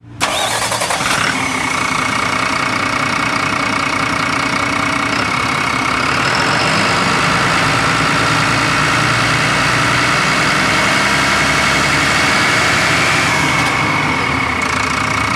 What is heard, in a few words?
motor, volqueta